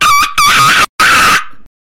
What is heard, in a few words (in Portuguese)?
grito